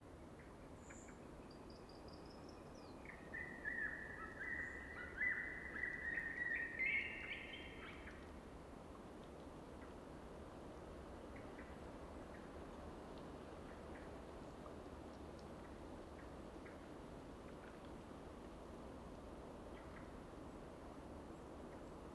Eurasian Pygmy Owl Scale calls part of Mexican wave